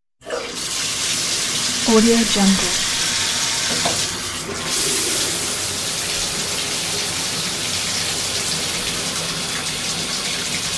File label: sound of water in the shower